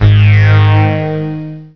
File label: beam